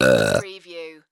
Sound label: Burp Male; sound-effects-bible